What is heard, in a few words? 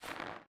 Step Snow; Game rip; MiSide